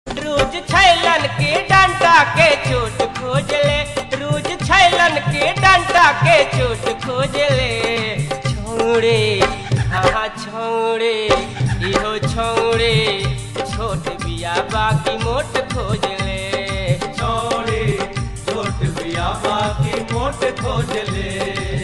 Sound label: BHOJPURI RINGTONE